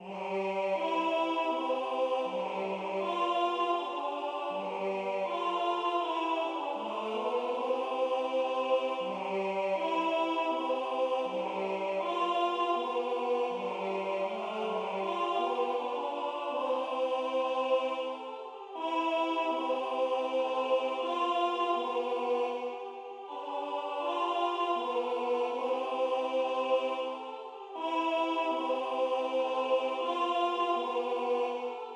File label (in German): Uf em Baenkli vor em Huisli Tenor